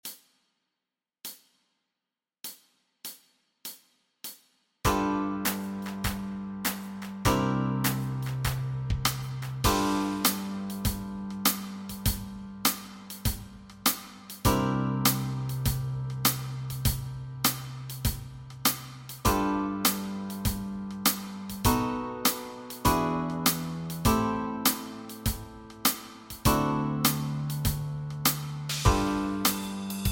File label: Backing Track, walking bass